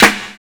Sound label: CLEAR BEA; Univers Sons - Kitch 70s